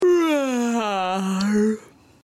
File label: block